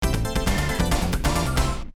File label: Game Clear